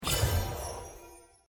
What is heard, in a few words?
sound dragon